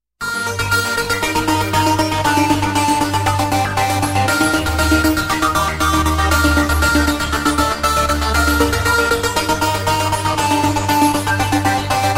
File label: Electronic Tone